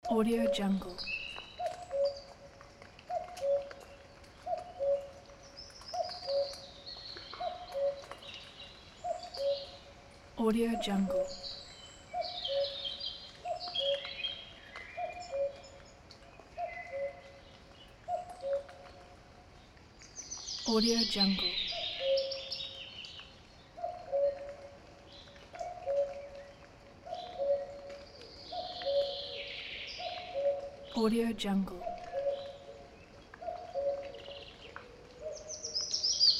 afternoon forest ambience with cuckoo and raindrops